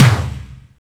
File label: Tom, Percussion; Vengeance Samples